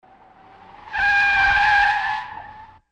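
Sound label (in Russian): пронзительный визг в гулком помещении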